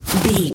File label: footstep snow walk